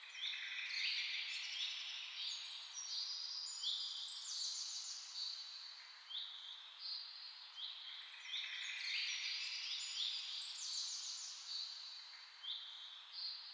Amb Birds Reverb; Physicist

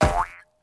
Character Down; hello kitty friends match; android

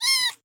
Rats; Rabbids Multiverse

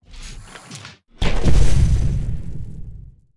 Normal Attack; Zerom

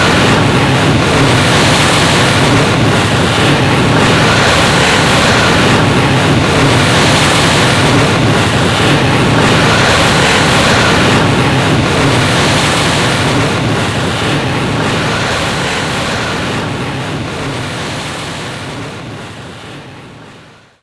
Sfx Winter games

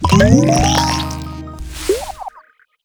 Checkpoint

